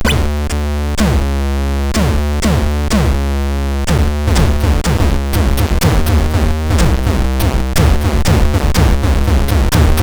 War Drums, MOS6581R4

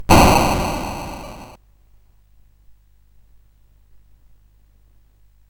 sid CSG8580R5, GAMES; Dragons of Flame

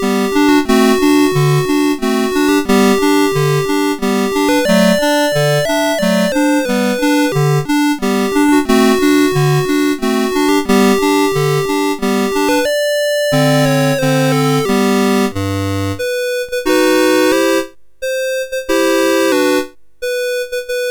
Menuetto, MOS6581

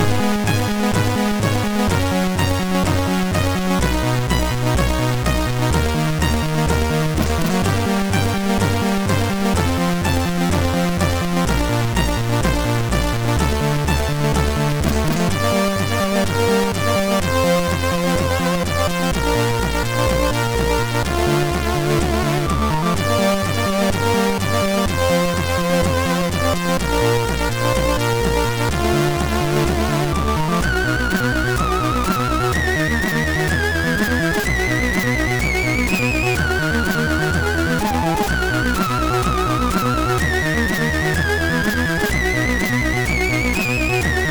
sid MOS6581R2; Double Sphere

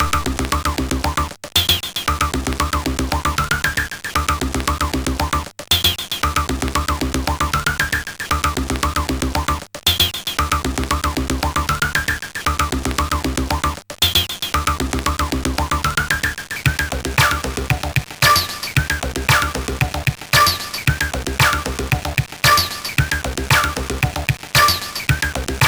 Sampled sid MOS6581R4

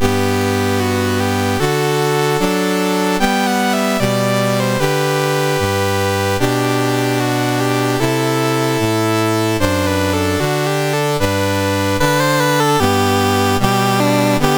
Guinea, National Anthems, MOS6581R4; Dave Marquis

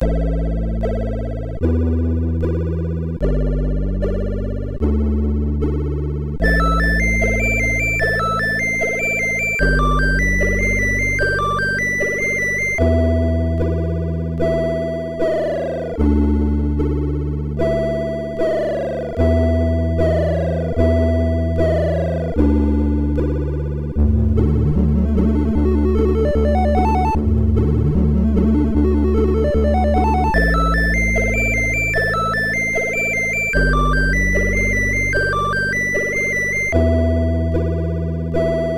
New Jump note sid MOS6581R4

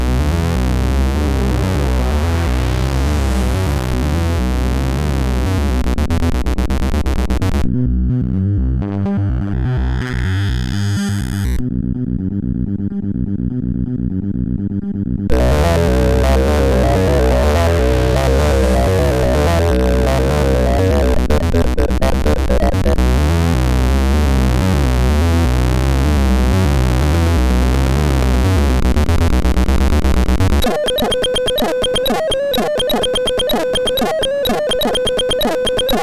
Techno sid MOS6581R4

